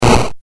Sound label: crash